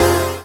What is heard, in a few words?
Synth Sound; Vengeance Samples; VEE Synth Shots